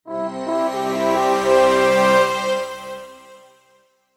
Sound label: multimedia game musical success complete orchestral horns